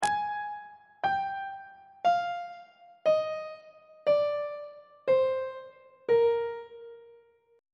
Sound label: a sharp mixolydian mode descending; on treble clef